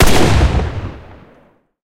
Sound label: cannon 30mm mk103 38 shot